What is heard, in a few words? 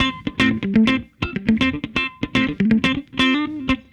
Guitar, 70s Analog Funk